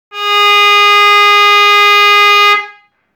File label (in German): Glas